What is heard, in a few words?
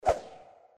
Bonus move; St Paddys Day Blast